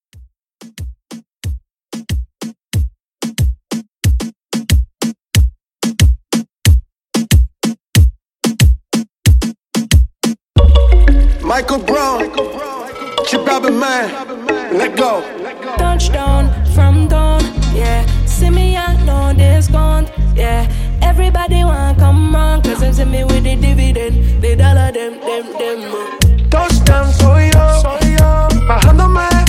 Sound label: Latin Reggaeton Redrum; Remixfreakz